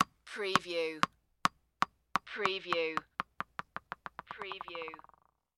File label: Tennis Ball Bounce; sports games; tennis table tennis; Sound Effects Bible